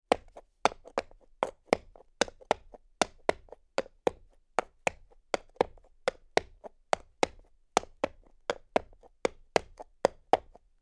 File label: Horse Walking on Concrete